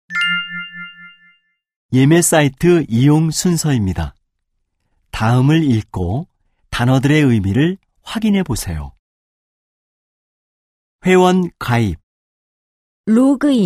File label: K-POP